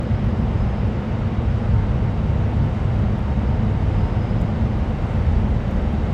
Sonic Ferry Interior Loop

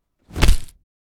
Fight Perfect Punch Hit Kick Stroke

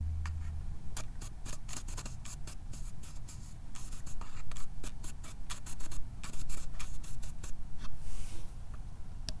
Shuffling

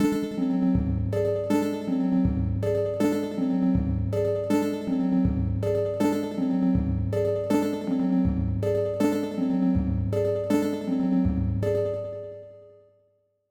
Comical Beat

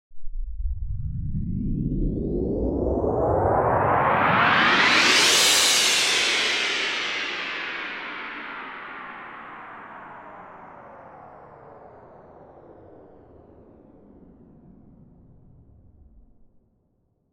Cymbal Shifter

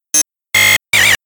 Digi Buzz

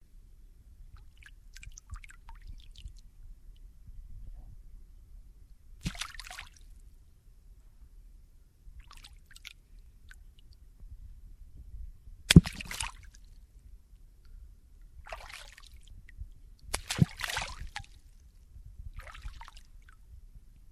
Object dunk

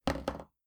Ker Plonk

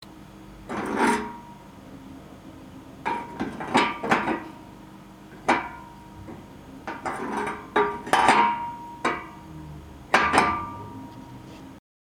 Hitting Metal Bars Against Each Other

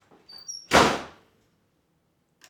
Door Slam

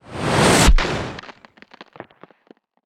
Whoosh Into Hit And Debris

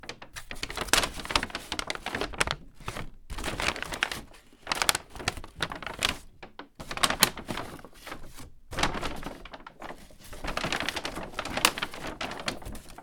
Paper bag

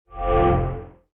Mail in box

